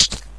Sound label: spitter spit hit; svencoop; custom monsters